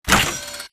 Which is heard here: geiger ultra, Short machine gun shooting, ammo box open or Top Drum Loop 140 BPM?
ammo box open